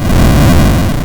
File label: Hard PCM Chip; chiptune